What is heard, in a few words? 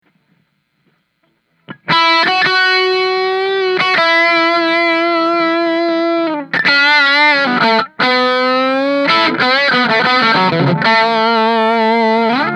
bridge dirty